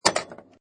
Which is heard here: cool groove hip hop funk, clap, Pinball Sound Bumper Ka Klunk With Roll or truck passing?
Pinball Sound Bumper Ka Klunk With Roll